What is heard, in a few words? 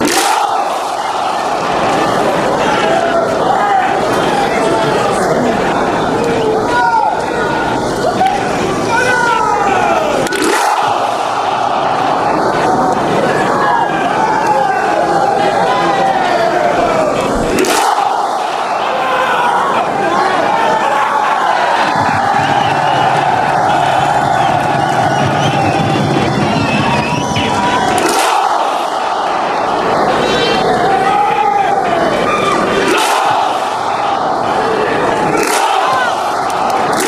clapping